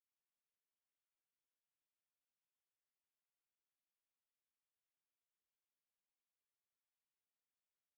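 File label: endings outro, disappearing fading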